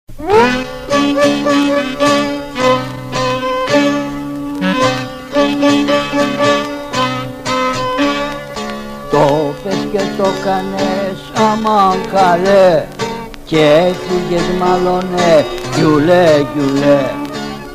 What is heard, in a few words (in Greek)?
hatzatzaris tragoudi